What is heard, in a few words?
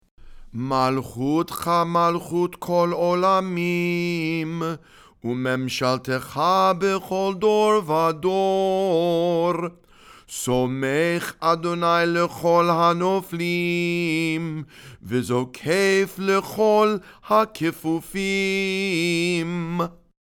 ashrei read and sung